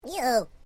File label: Created Creature Small Eww, Alien Sound Effects; Created creatures, vocalisations and pretend speech